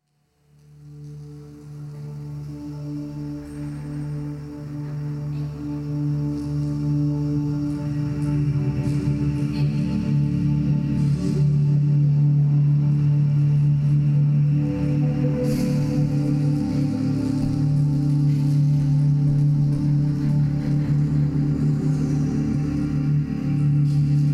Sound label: Low Underscore threatening